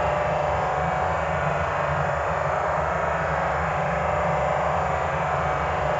Panned Organ, non tempo loops

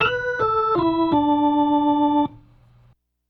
Soul Organ Riff, 70s Analog Funk

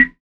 SAND, Drums; Distored Reality 1&2

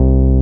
SYN TB, Roland TB303; Sound & Vision - Gigapack I CD 2

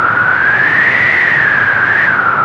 WIND

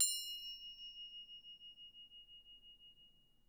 Percussion F4, Harp; Miroslav Vitous - Percussion & Harp

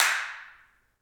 CLP HNDCL, Handclaps; Roland LCDP14 Africa VOL-2; Afr. Hands

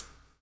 BLUES TK, Backbeat; Spectrasonics - Backbeat

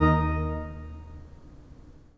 PIPE, 3DOrgans; E-MU Producer Series Vol. 5 – 3-D Audio Collection; 3D Pipes Dr Joyce

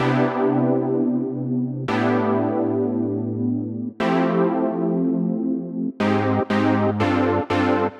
Chord Synth, 120bpm; Zero-G; Nu Disco; Nicengroovey C

